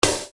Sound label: Snare Dr